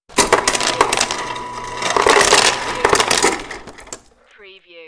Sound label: Ice Maker